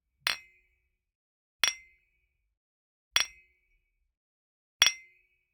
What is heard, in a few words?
Generic Click Glass Small